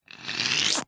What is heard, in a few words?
cartoon suck pop; zapsplat